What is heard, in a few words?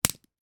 household wall plug remove from pack